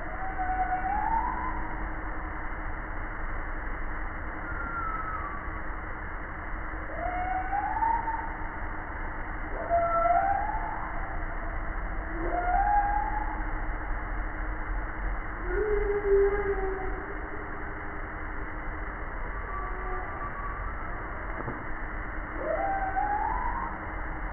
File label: humpback